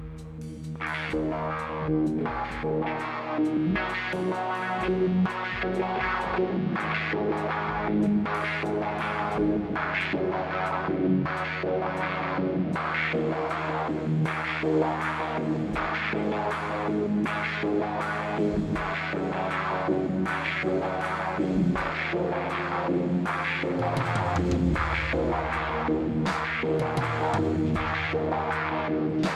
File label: Drums From Both Ends